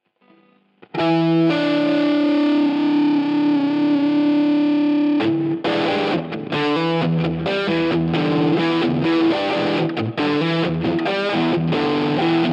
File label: Distorted Middle Marshall Plexi and Tube Drive